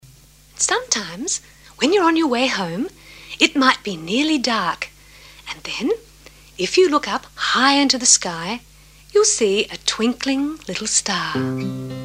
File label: Twinkle Twinkle other version